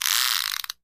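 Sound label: Coffee Grinder Manual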